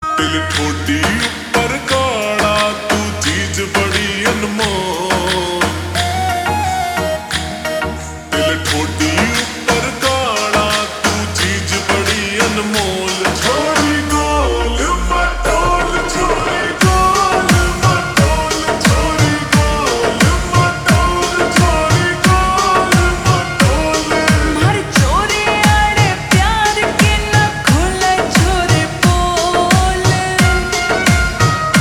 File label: Ringtone Slowed Reverb